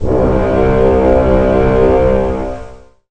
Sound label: bighorn